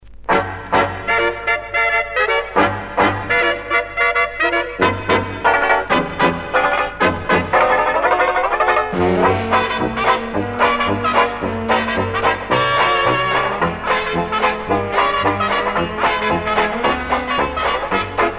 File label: banjo